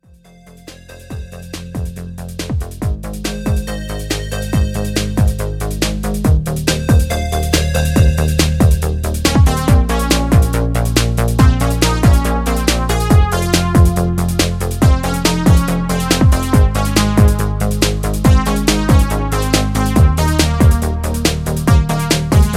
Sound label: SYNTH ALIEN